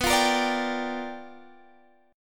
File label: G Minor 7th, B; Gm7/B strummed; JGuitar